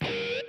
censor pinch harmonic; metalocalypse

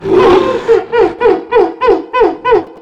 great jaggi call

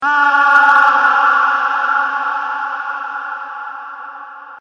death yell

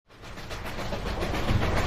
steam train

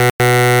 error sound; sonic

